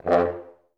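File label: French horn staccato F1, Advanced Orchestra; Best Service; Peter Siedlaczek's Advanced Orchestra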